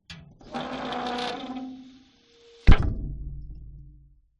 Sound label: industrial lever pull old rusty grind